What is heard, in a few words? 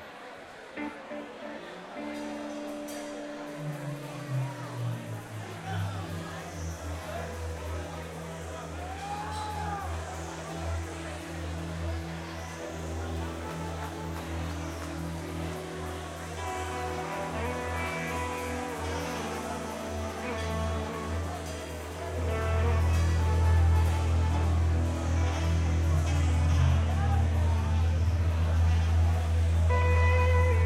dub Synth; fatfrogs